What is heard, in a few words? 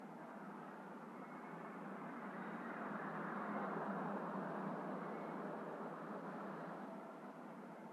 desert light wind; ambient; atmosphere